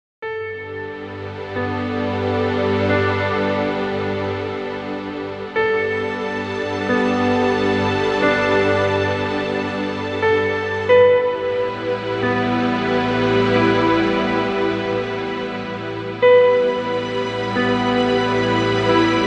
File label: Piano and Strings